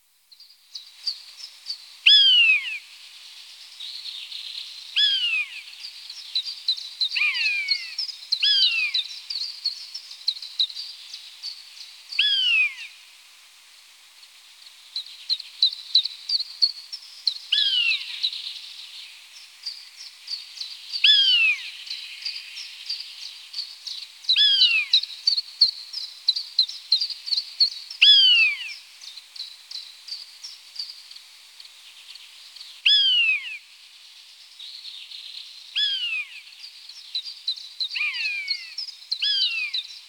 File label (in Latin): Buteo buteo